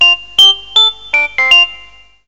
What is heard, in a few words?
Sms